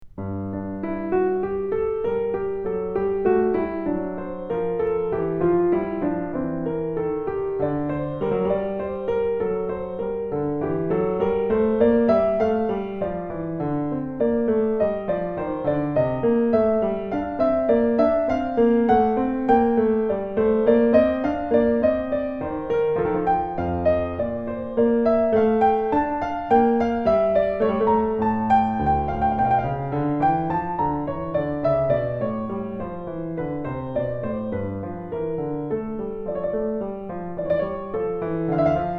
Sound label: Invention Piano; Bach